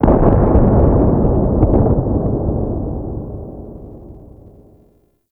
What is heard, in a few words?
Fortress Distant Asteroid Impact; Ambience; Warframe; Grineer Fortress